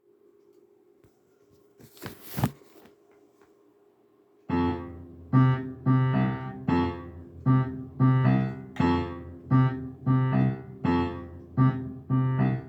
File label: Catch a star Fluglehorn